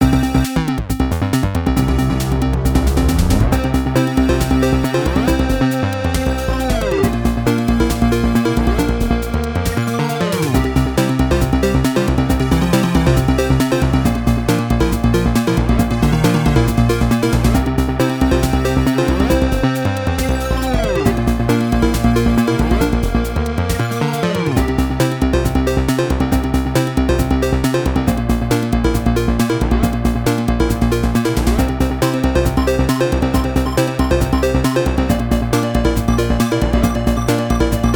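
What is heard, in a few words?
ITEM PSG version; The Adventure of Little Ralph; Item, Please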